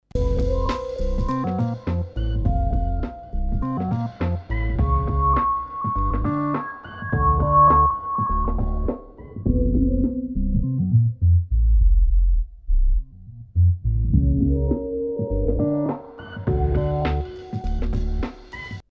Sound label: Numark v7 lpf